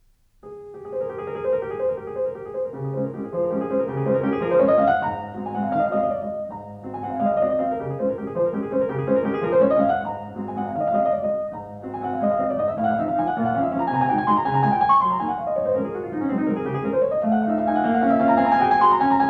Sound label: Valse Nr 06 in D♭ major Op 64 1, Piano; Chopin; Dinu Lipatti